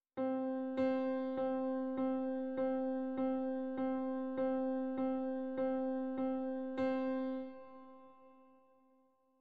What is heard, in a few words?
Test mp